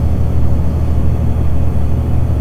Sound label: big fan; Dystopia; exodus